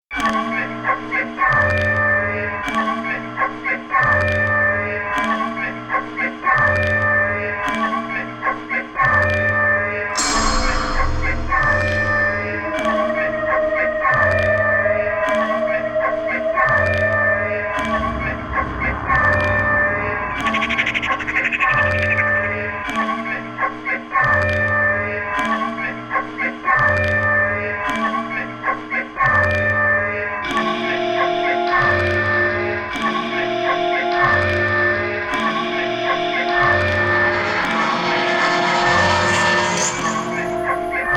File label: (FX Only)